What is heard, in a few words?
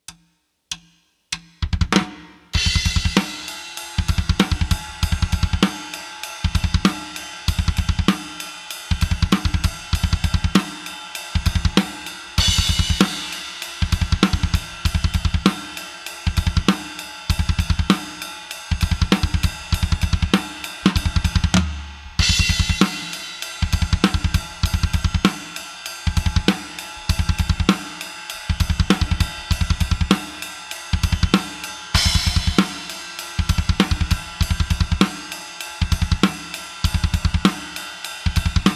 triplet kick groove